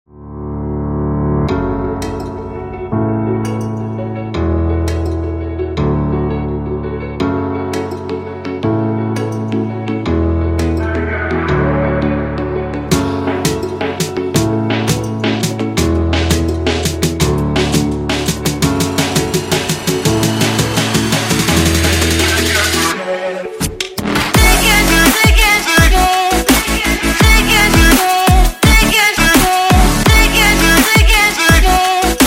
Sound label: Royalty Free Exciting Background Music